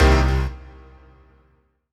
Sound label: HOUSE, House Dance